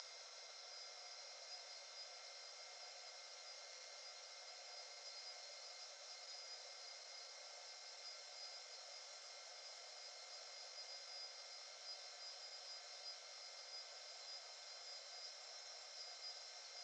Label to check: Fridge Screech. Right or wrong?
Right